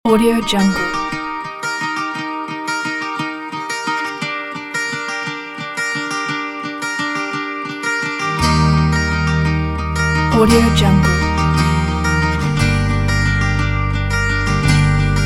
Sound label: mountain song